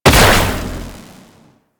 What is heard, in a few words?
Amalgam Moa Satyr Weapon Fire; Warframe; Weapons